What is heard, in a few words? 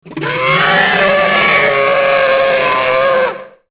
t rex; zvierata; divoke; zem